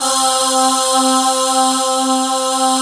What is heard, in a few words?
GIRL SH, FAIRLY VOX; Synth